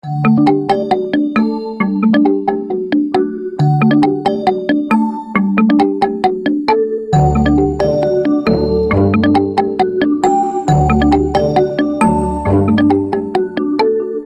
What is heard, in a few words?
xiaomi redmi k70 pro; Xiaomi Redmi K80 Pro